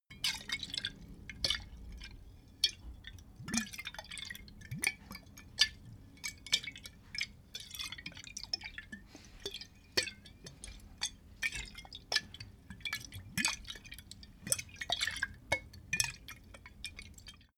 bottle vodka movement, gastronomie

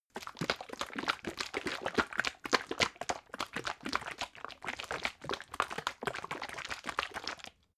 bottle syrup shake slow, gastronomie

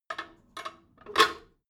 drain valve lever handle heavy, Badezimmer; haus garten

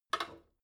drain valve lever handle short, Badezimmer; haus garten

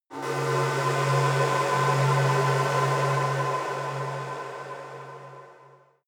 shot dark shining glance, sweeps und swells; comedy-cartoon; soundpool

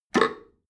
drain valve open heavy, Badezimmer; haus garten

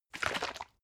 bottle syrup shake single, gastronomie

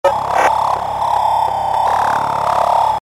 distorted event, mystik; atmosphaeren umwelt

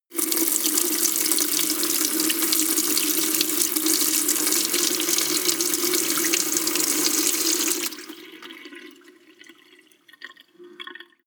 sink water, badezimmer

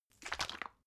bottle syrup shake single viscous, gastronomie

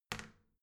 toilet seat wall open soft, badezimmer

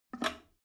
drain valve open soft, Badezimmer; haus garten

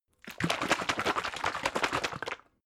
bottle syrup shake fast, gastronomie

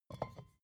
bottle vodka pick up, gastronomie

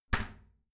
toilet lid slam soft, badezimmer

